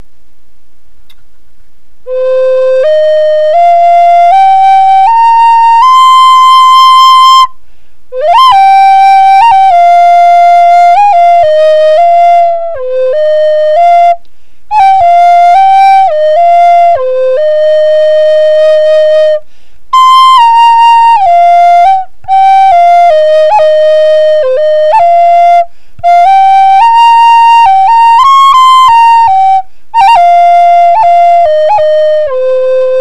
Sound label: C high 75 bore, flutes